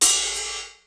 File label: Studio Open Hat, Ensoniq ASR-X Pro